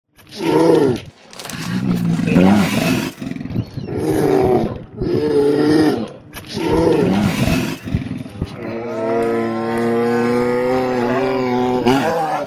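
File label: grizzly voice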